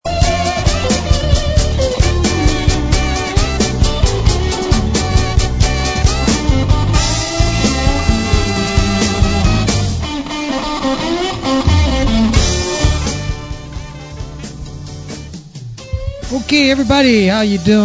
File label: music stream